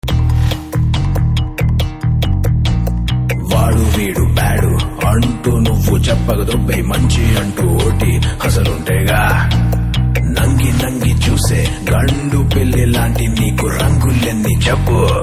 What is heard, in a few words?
Theme Telugu Ringtone; RED